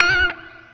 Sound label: Guitar Squeal